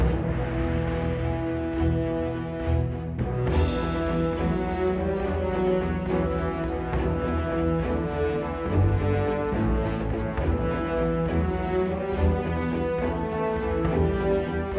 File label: battle theme loop